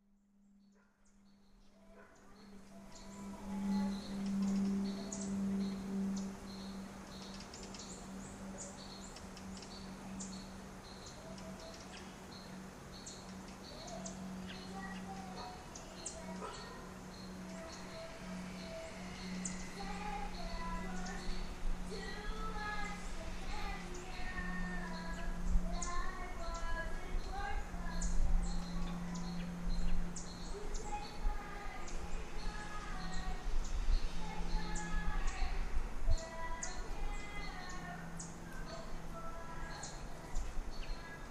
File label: backyard birds and neighbor singing